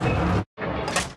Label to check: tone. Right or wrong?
Wrong